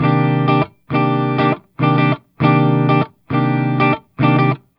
100 Jazz Guitar Chords C min9, Fusion; Pro Tools Essential Loops; Digidesign

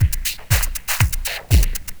120 Dance E-Perc; Pro Tools Essential Loops; Dance DJ; Percussion

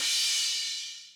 Hip Hop Cymbal, Drums; Digidesign; Pro Tools Essential Loops

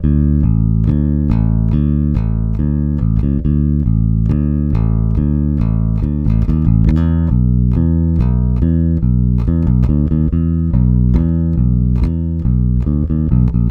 Country Bass Chorus; Chorus Sec 2; Digidesign; Pro Tools Essential Loops

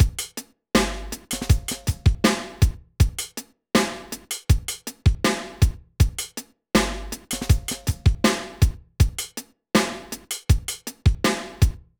080 Chill Pop Drums; Pop Rock; Moderate Tempo; Pro Tools Essential Loops; Digidesign

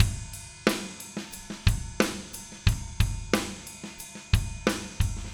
090 Alt Rock Drums Chorus; Pop Rock; Moderate Tempo; Pro Tools Essential Loops; Digidesign

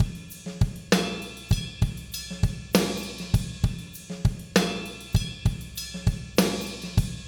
066 Pop Drums (128); Pop Rock; Slow Tempo; Digidesign; Pro Tools; Pro Tools Essential Loops

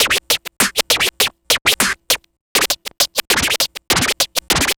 Vinyl Scratch Snare; Digidesign; Pro Tools; Pro Tools Essential Loops; Dance DJ

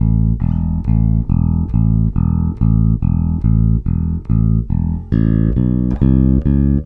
Country Bass, Pre Chorus; Digidesign; Pro Tools Essential Loops

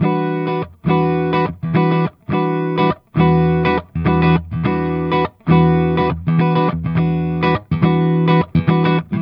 104 Jazz Guitar Chords E min9, Fusion; Pro Tools Essential Loops; Digidesign

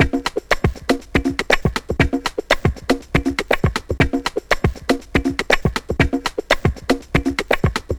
120 Pop Midrange Beat, Fast Tempo; Digidesign; Pro Tools; Pro Tools Essential Loops; Pop Rock; Drums

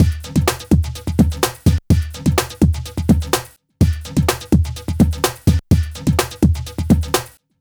126 Pop Beat; Digidesign; Pro Tools; Pro Tools Essential Loops; Pop Rock; Drums

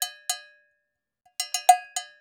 Dance Hi Bell; Dance DJ; Percussion; BellCowbellAgogo; Pro Tools Essential Loops; Digidesign

